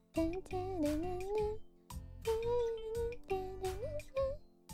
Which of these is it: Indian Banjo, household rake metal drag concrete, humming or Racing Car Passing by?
humming